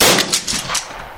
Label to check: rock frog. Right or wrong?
Wrong